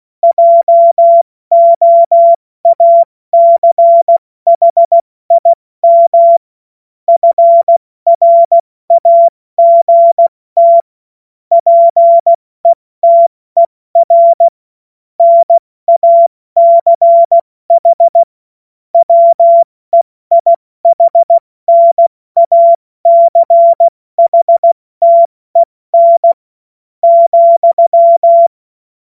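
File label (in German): Witz, Morsekurs, cw morsen; Witze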